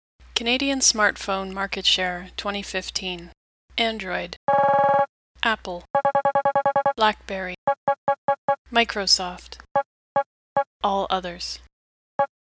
Single sound, integrated legend; frequency to value

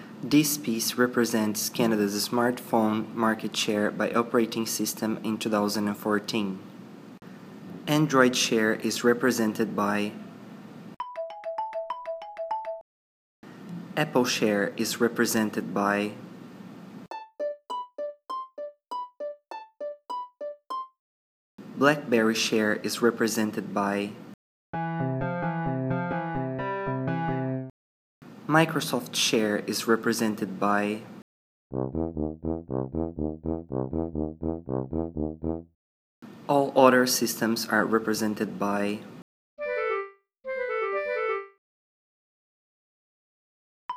Sonification

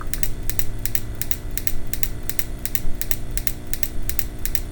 Negative Low Voltage

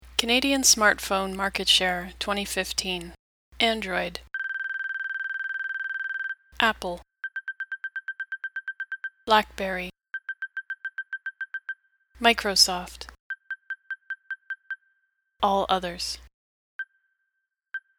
sonification density

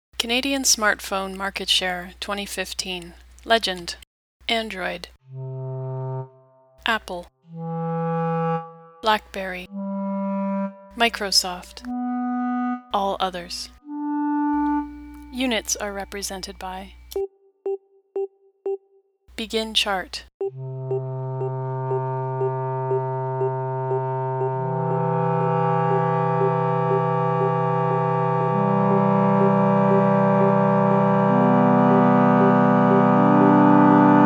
sonification stack R units pitch clarinet

